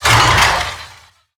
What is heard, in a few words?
Necramech Melee Pain; Battle Barks